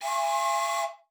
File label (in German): ZUGPFEI; Xsample VOL-9